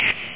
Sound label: hihat; Workbench Add-On - Volume 1